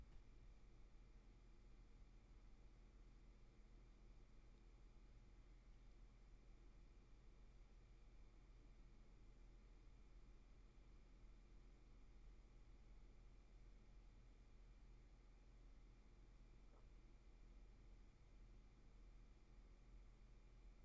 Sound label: roomtone